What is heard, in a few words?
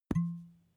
glass bottle plop